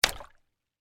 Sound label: Water Balloons Hit, Together; Cartoons; BlastWave